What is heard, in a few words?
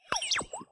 code block trashed; Ben 10 - Omnicode